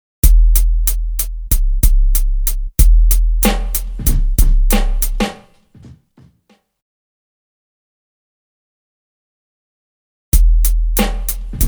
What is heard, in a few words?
Drum loop; BEST OF DRUM BREAKS 30